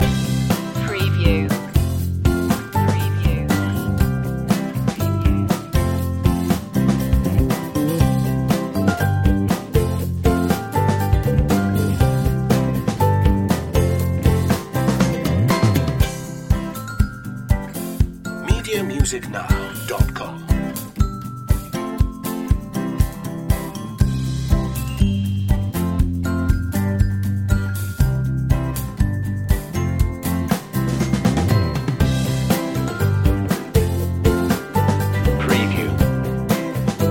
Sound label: born to be happy loop